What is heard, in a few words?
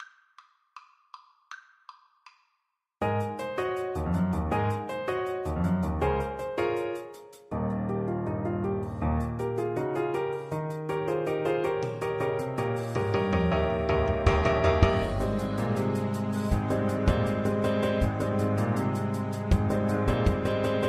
Para Julen ASAX